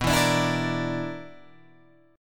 B-Minor Major 7th Double Flat 5th B down Guitar Open G